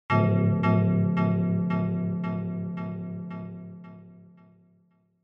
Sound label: Negative Delay; Onoma